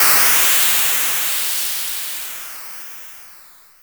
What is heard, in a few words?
SWEEP; Zero-G Ambient 1; NOISE